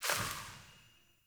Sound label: Sparrow Death State One Wing Flaps